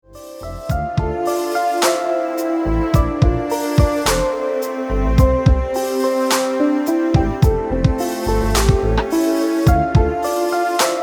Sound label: Off Vocal